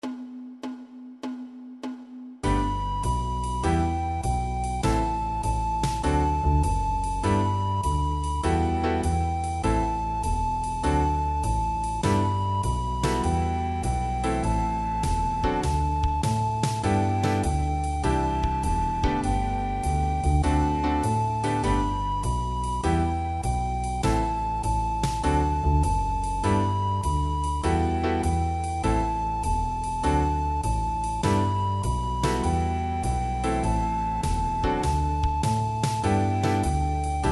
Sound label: with melody